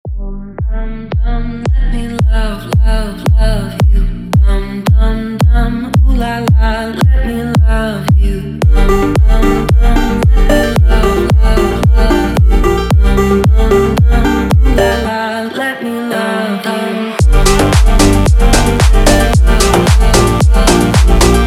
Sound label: super slowed, funk